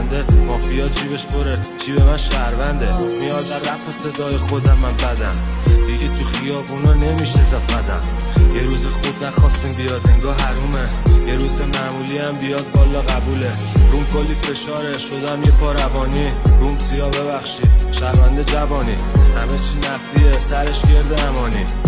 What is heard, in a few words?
Remix Rap